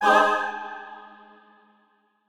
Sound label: chor